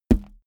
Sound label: balloon hit flick